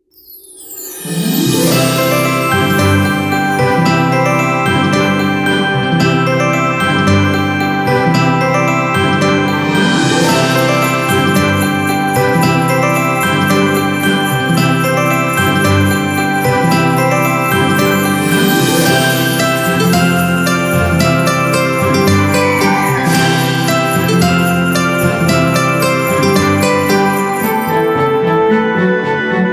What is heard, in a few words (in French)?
musique